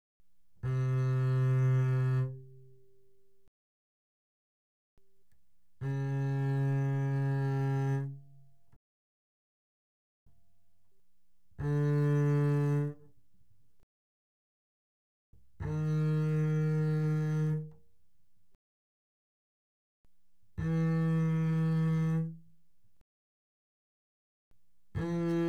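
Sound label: Bass arco fortissimo sul A C3 A3, doublebass; Strings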